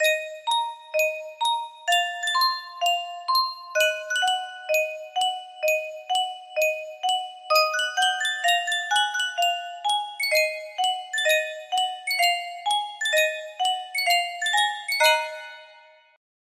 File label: music box